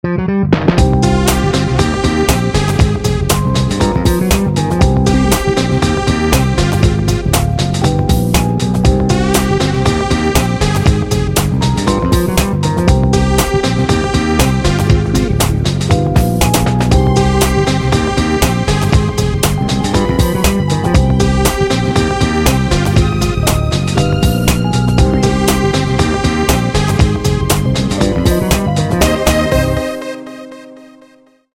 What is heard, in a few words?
funky junkie